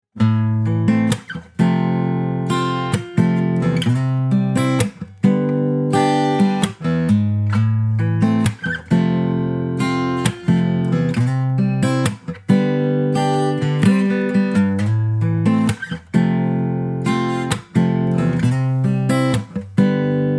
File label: backing track